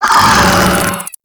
Desert Skate Vocal Death; Warframe

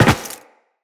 Land Metal, Footsteps; Tenno

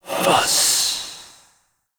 Fire Whispers; Weapons; Warframe; Tenno; Grimoire

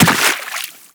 run water, footsteps; Warframe; Teshin

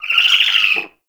Owl Idle Chirp; Warframe; Duviri; Glyph Puzzle

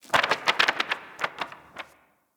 Station Misc, Ambience; Soul Plane; Orlick

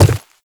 Infested Footsteps Quadruped Medium Walk Dirt; Warframe

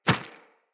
Inf Small Walk Default, Footsteps; Infested

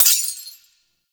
Boss Sword Enemy Frozen, Weapons Melee; Warframe; Orokin Weave Sword

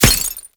Helmet Impact; Grn Chem Nox

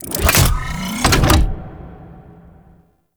Railjack Turret Harness Pilot Equip; Warframe; Vehicles

